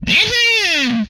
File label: Inhuman Hit, Vocal; retro arcade